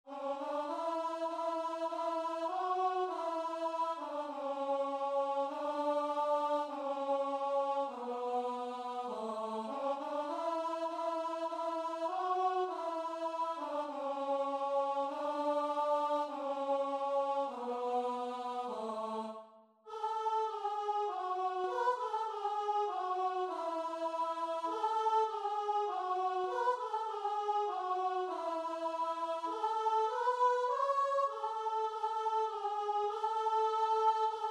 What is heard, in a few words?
hail holy queen, guitar and vocal